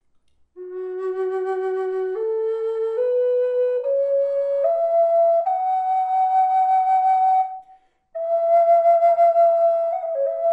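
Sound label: reverb, flute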